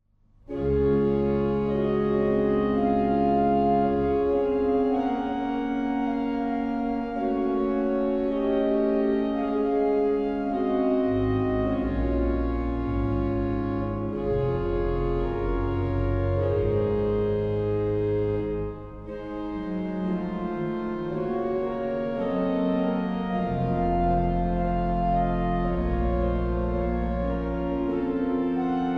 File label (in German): Praeludium, orgel; Aulfingen; kulturerbe orgel